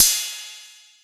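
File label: Boss DR-202 cymbal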